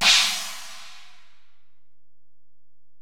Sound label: Ride, Alesis DM-PRO, Drum Machines